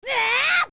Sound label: Young Fire; Link casting Din's Fire; OOT